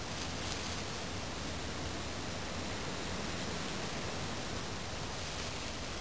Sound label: road; arab